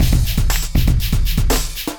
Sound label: Acoustified Kit, Drums